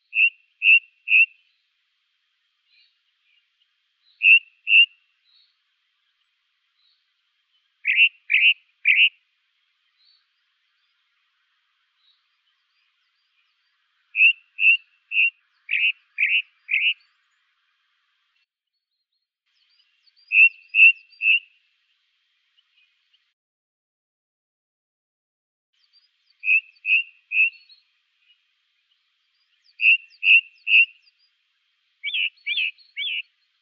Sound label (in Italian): Merlo dal Collare Turdus torquatus; Versi Animali; Il Forum della Fotografia Naturalistica